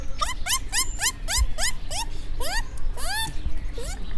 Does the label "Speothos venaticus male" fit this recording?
Yes